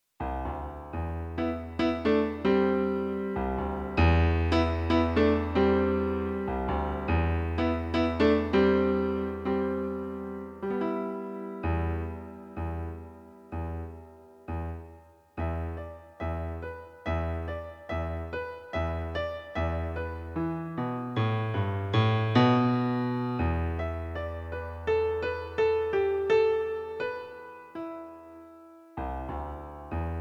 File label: piano; All Mixed Up